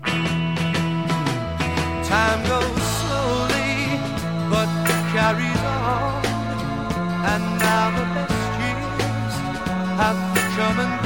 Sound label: laughing